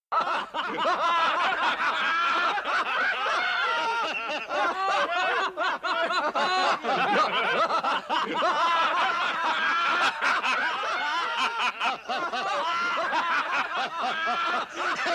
laughing; thunderbirds

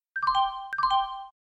windows xp alert

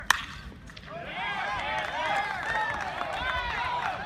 hit

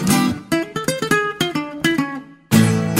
long flamenco guitar mix spanish guitar

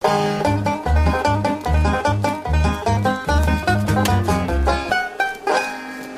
banjo; scrubs; german

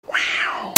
ow

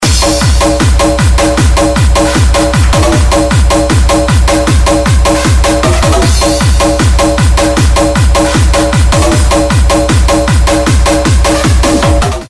hard bass

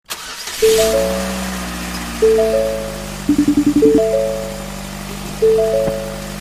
ford door chime

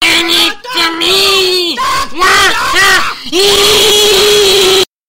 wahahi

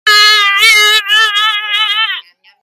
screaming cat

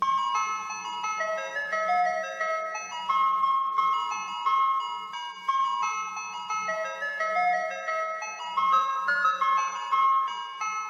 ice cream truck song

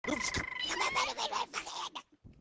funny noise

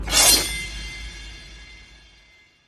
unsheathe

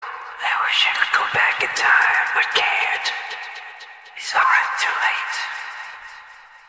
horror mode party club

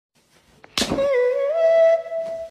heeeeeheeeeeeeeeee

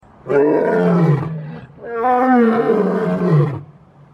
roar lion

